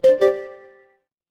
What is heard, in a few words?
ui alert